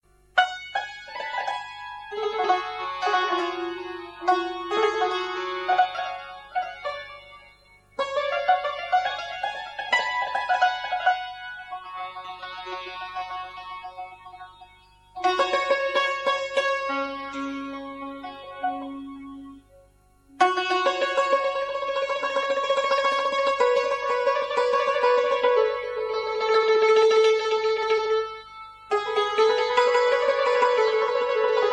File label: santur